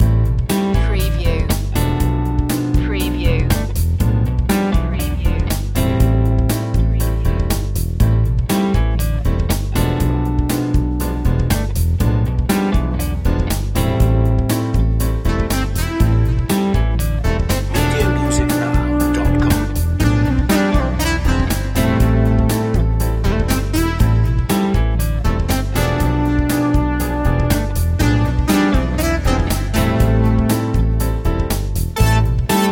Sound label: synth mambo